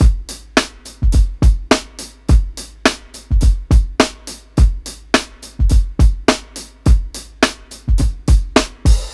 105 8TH BEAT, DRUMS LOOPS; BASEMENT DRUMS VOL 2

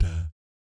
DAT E2, Jazz FX; Spectrasonics; Vocal Planet; JAZZ COMBO

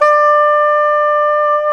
SAX SOP SAX, Soprano Long; Roland LCDP07 Super Sax

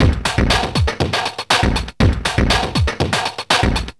Beat, Industrial

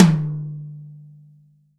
TOM, Real Drum Kit

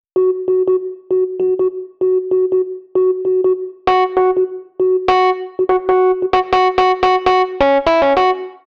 Rhodes Solo, Cinematic Funk; Sony Creative Software; Urban Tone Poems; Solos

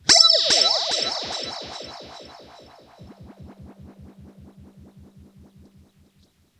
RIPPLES R, Funk Guitar; 90 s Sample CDs; Zero G

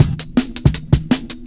Victory 162, breaks

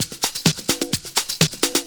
Latin Loop 128 BPM

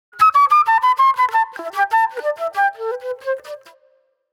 Pop Flute

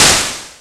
Found Sound, Drum Machines

